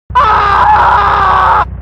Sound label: Death Scream; Twisted Metal 4; PS1